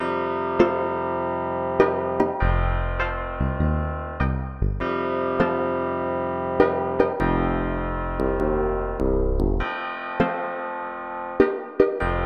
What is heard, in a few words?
drum